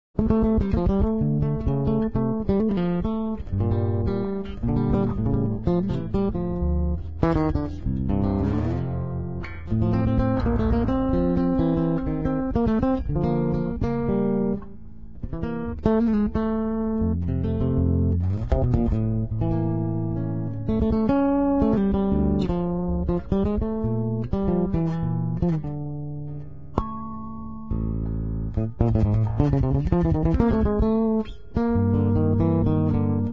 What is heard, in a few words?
Guitar Electric Bass; Zomboid; Lifestyle